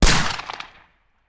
Musket; Sound Ideas; Guns

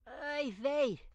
grandma short yell; Blind Drive

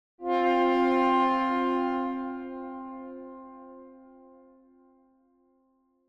Tuba Tone - Duet, Higher; Hollywood Edge; sound designer's toolkit v2

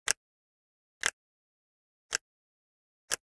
Generic Button Metal Small

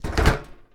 Book Place Metal Shelf; SFX Kit; Foley - Misc

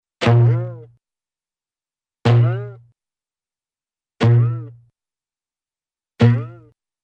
Harp Boings For Shooting Arrows, Cartoon; Hollywood Edge; Cartoon Trax

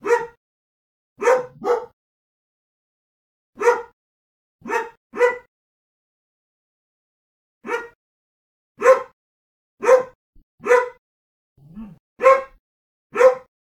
dog - mixed breed; animals; NS Studios - ultra sound collection

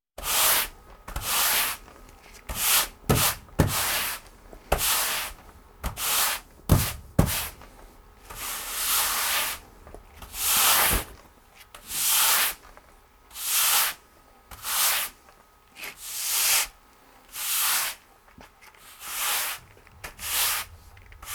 Walking Machinery Trucks

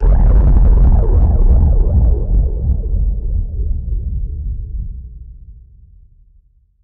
NOIS; XXL 800 Production Effects

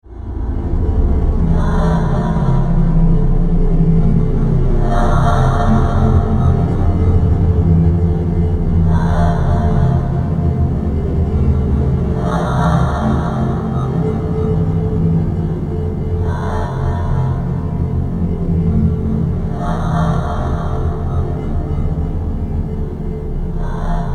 Low And Eary; RoosterFX; Drones

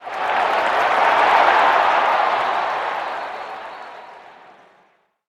crowd exult; Blind Gladiator

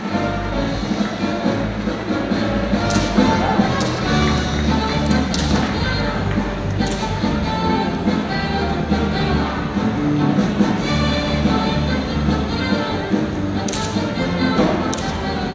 circus music horses; best service Blue Box

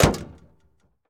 Jeep Wrangler Hood Close; Trucks

